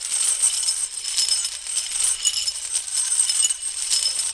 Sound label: map goldcoin; classicro